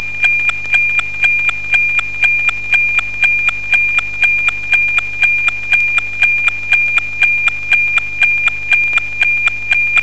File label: noaa, sounds